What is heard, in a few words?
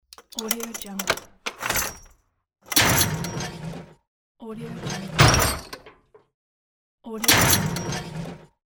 metallic door